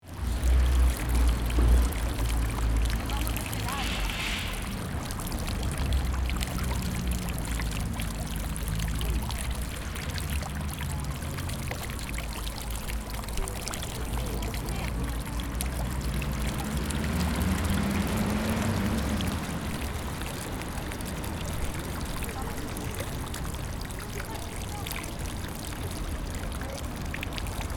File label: City square ambience exterior public fountain